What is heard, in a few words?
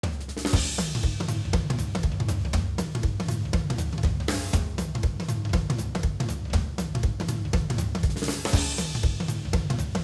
BGM; PAPER MARIO Color Splash; wiiu